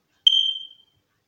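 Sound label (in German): Störungssignal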